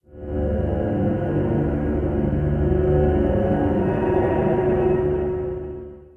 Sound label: gameover; deka gameover; Dynamite Deka; PS2